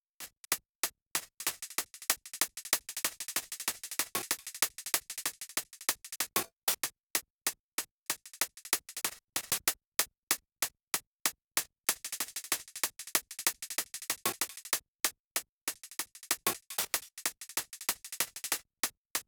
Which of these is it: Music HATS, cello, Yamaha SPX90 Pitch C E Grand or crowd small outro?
Music HATS